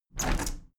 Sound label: window handle plastic squeak open heavy; objekte; fenster